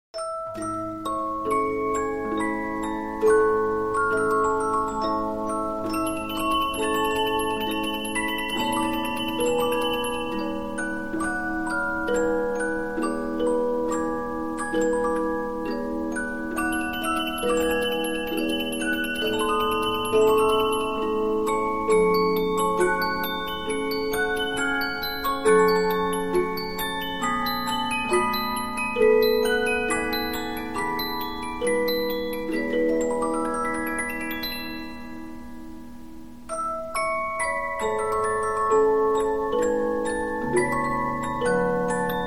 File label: Polyphon, 15inch disc musicbox